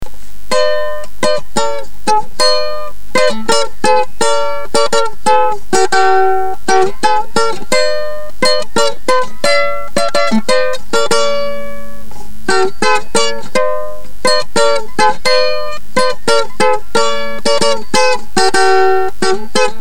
solo na mandoline; twerskoe

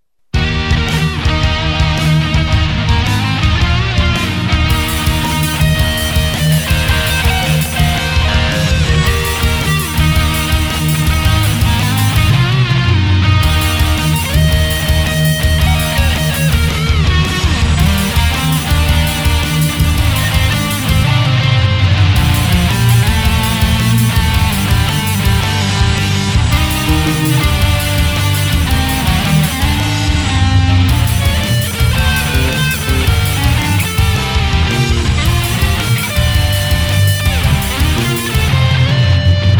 metallicheskij dzhaz

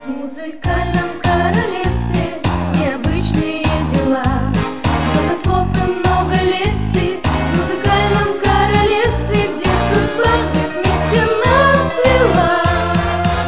kwartet, detskij hor

